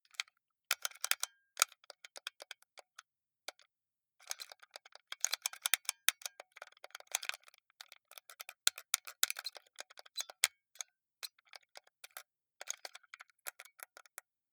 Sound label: console controller xbox play sequence shooter